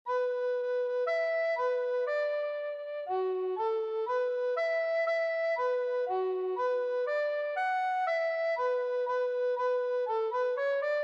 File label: Goddess Tenor Recorder